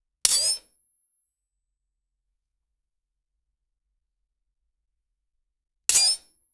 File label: charging power before thr, knife